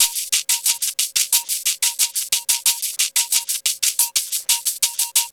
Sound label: Percussion, R&B Drums